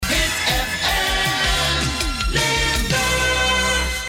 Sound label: jingle; HIT FM